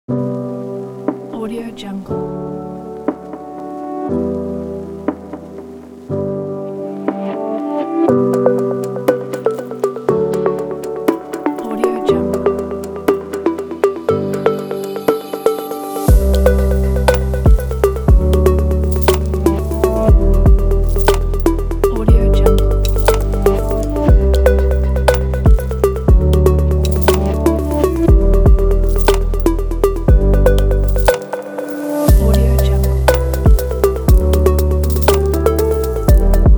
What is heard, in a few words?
slow motion abstract